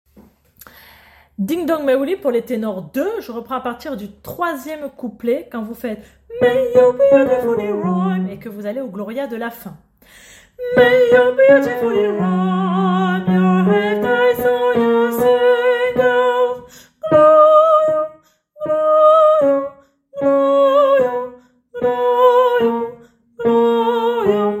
Tenors

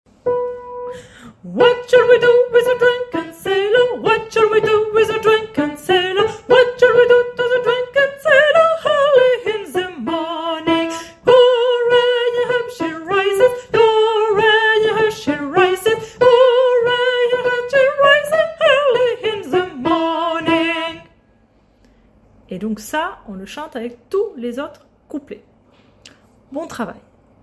tenor; What shall we do

